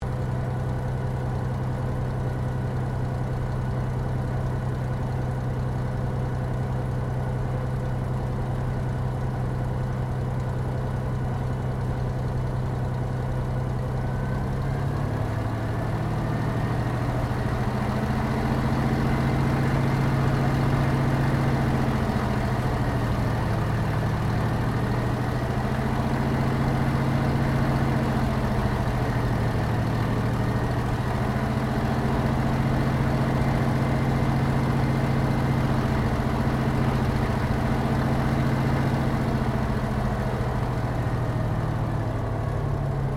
AIRCRAFT SUPERMARINE SPITFIRE MK-XVI TAXI INTERIOR STEREO; Airplanes; Sound Effects:Vehicles